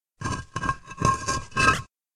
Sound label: Medium Rumble Walls